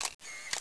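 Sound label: Camera shutter sound; Sony Ericsson; P900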